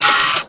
VDU OFF; FORSAKEN

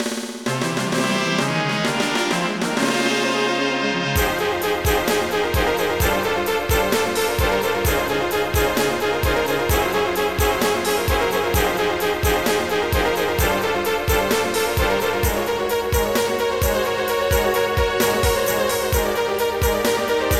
fan; FF7DEMO

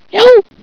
yelp; Monster Media Number 15

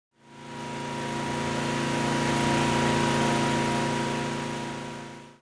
MOWER; zones residential; PC Gamer IT CD 40

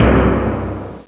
timpani C2; Star Trek JR Audio

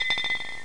The Coin; ECR14 Demo

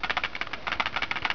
after-lever clicking; Freeverse Software; Enigma v1.4

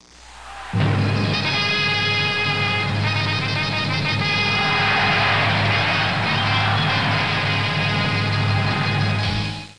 foot; My Science Fair

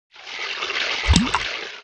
JUG POUR

